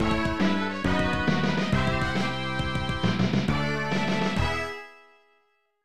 Unused Jingle; Tecmo Super NBA Basketball 1993 SNES

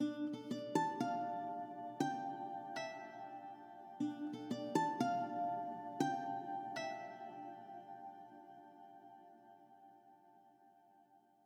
Harp

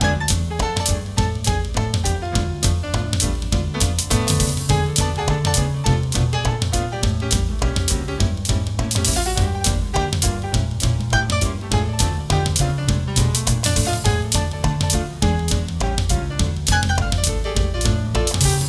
No Vocals; Generation Girl Gotta Groove

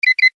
Fast Beeps; Infernal Radiation; macos

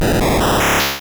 Synthesis; sfx; attack moves; pokemon; gen 2; gsc

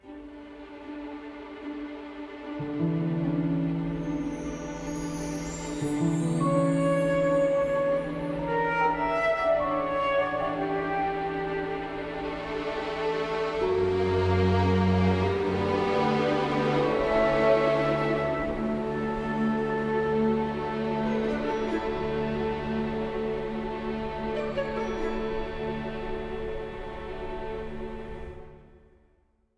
Hatchery Music; Jurassic World Evolution 2; PS4; Windows; Xbox One; Xbox Series X/S

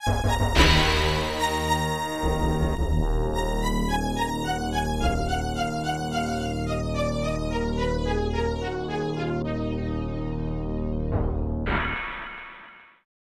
Death; hero senki snes

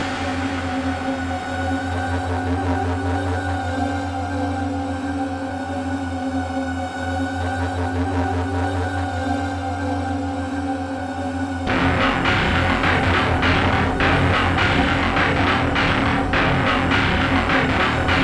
Fight

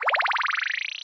Ready Riser; boomerang fu

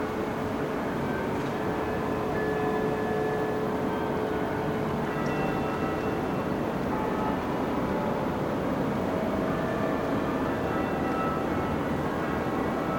Car; Prague Car L

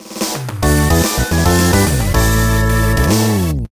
Fanfare Increased Ranking

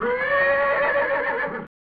Push Start; Keiba Eight Special 2; snes

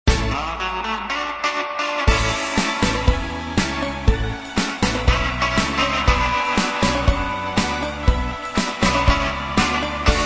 Packaging Loop; SpongeBob SquarePants Trail of the Snail

